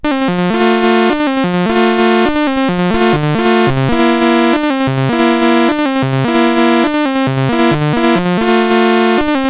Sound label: sid CSG8580R5; Quicki BASIC